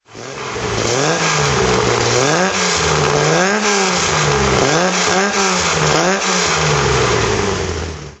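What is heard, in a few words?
datsun 240z throttle, engine sound; Datsun 240Z, 1971; V3D/ ClassicarImages, Marc Vorgers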